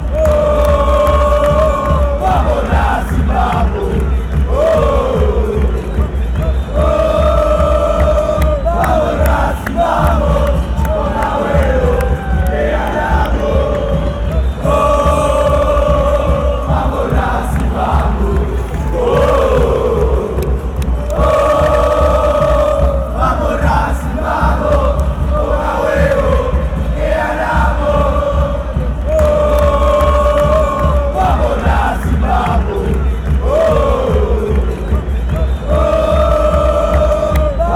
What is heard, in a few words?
oh come on, chants; racing